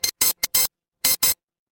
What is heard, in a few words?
office card reader